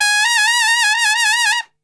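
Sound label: G# trumpet shake; Best Service; Brass Super Section; TRP SHAKE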